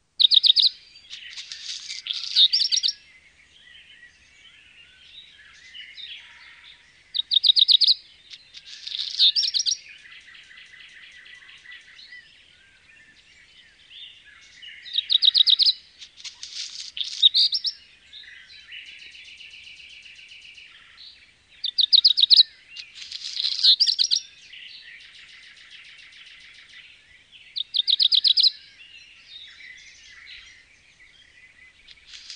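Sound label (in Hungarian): Házi rozsdafarkú; Madarak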